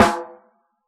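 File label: hip hop kit snare; Dr 202 Kit 1; Percussion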